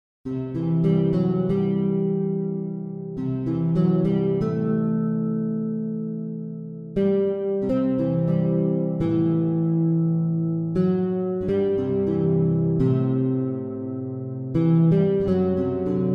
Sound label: guitar